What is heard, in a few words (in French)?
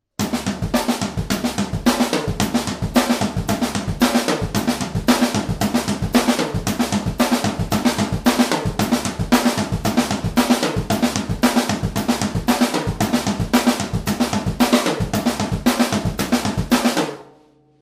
break passe partout, batteur